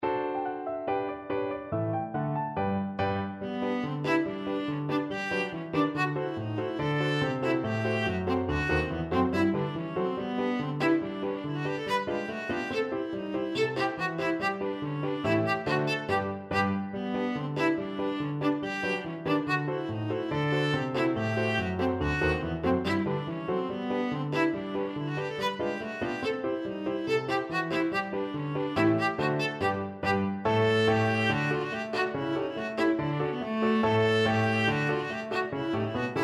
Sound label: viola